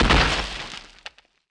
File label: footst